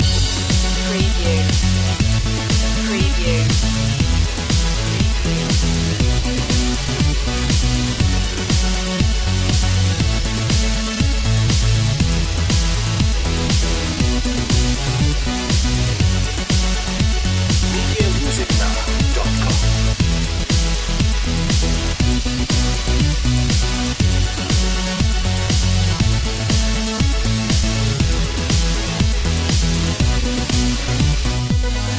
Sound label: electro bass dance loop